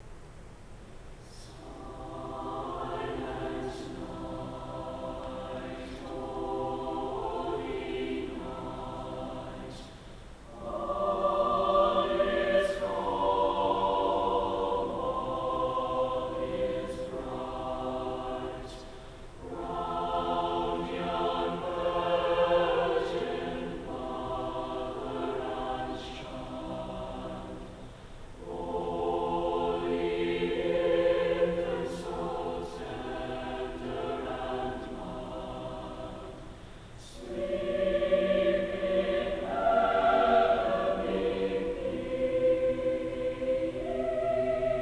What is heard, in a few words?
Silent Night, Holiday Concert; AHS 2003 Holiday Concert